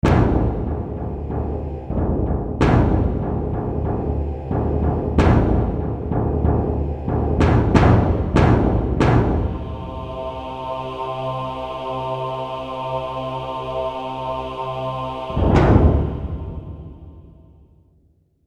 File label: Orchestral Phrase; FXHome